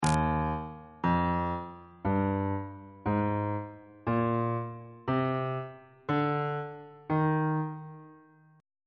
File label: e flat ionian mode note positions on bass clef